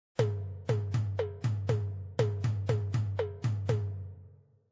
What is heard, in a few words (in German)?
Rhythmus